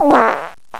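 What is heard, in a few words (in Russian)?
пукание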